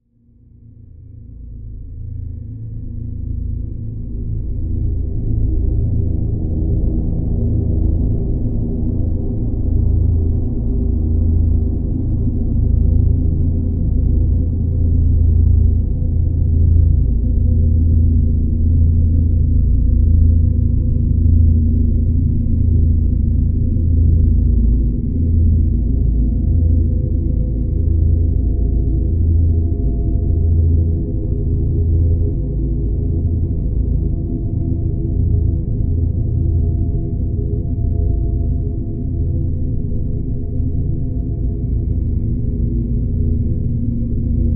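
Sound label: drone A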